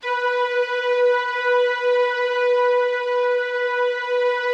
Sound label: B3, STRINGS; Yamaha VP-1; Music Store; JODYSTRINGS